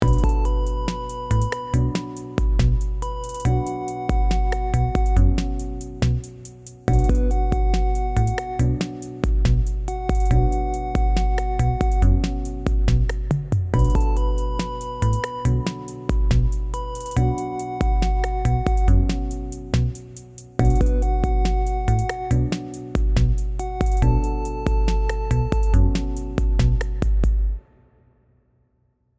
Music

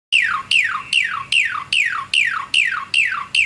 Recording, bird; Pengusir Hama Burung Otomatis

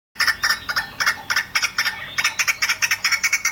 Recording, bird

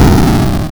Death

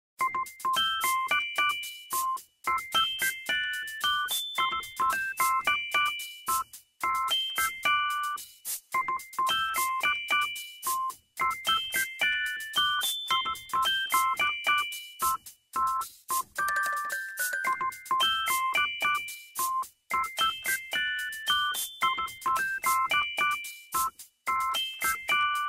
Music

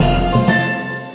Win sound

